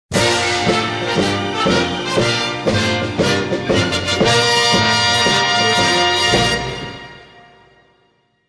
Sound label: Level Passed; Buildalot2